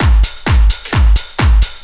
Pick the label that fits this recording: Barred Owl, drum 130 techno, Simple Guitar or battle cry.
drum 130 techno